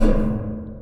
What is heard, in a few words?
Tails, Drum Bits, Verb Tails; layering